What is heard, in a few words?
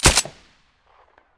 m9a3 silencer stereo; beretta